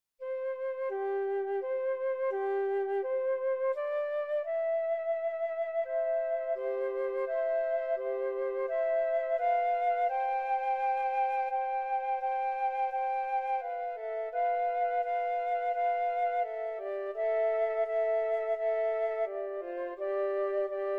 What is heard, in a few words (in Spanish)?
canciones para flauta, flute; CANCIONES DE FLAUTA PARA INICIACIÓN